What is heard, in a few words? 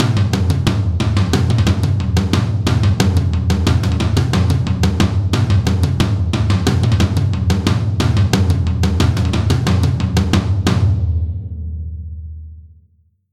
Drums Toms Tribal Beat 110bpm